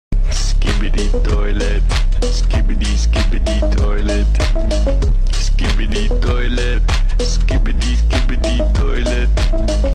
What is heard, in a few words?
beat